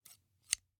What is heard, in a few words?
Scissors, snap, snip, shear, large, single; Eiravaein Works; Vaeyan IV